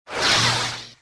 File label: saber hit wall; weapons